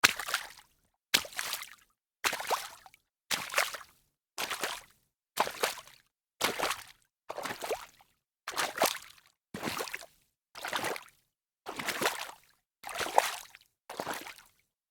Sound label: walking in shallow water